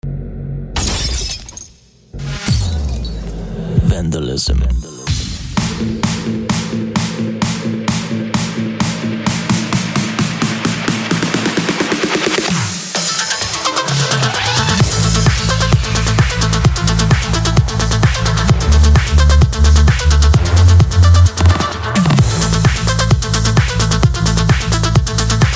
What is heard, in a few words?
DRUMS Future Rave